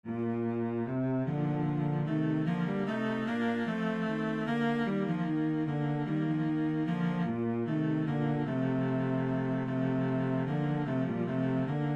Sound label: minuet, cello